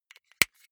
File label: marker close lid